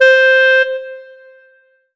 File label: Kawai K5000W Clarinet C5